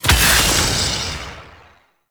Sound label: Nail Grenade Deactivate; Warframe; Trapper